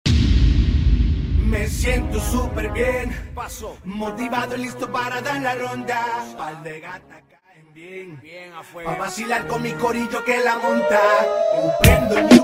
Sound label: Open Show 96 BPM